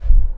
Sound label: wing flap, Wings; Malbers Animations